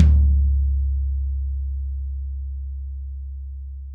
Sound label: NC16TMD SL, drums toms dry; Real Mega Drums